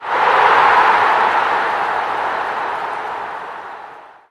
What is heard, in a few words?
audience; retro-bowl